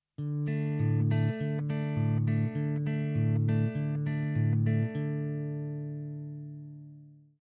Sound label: D Chord Bass, guitar